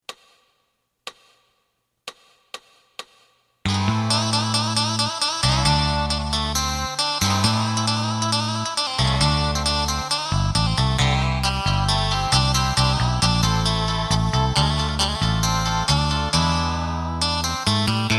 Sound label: drum